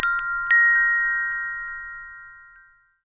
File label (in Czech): zvonek; Zvonek ke dveřím; při použití zacinká; Zvonek ke dveřím 50 zlatých za zvonek který při použití zacinká